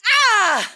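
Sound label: female death; WarRock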